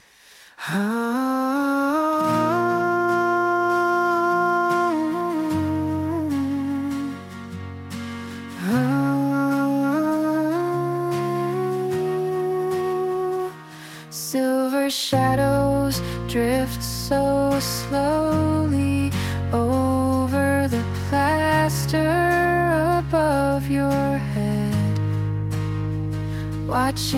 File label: sandra lullaby